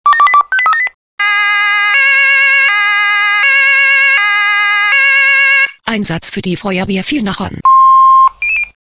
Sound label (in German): SMS Alarmierungstone; FW Villnachern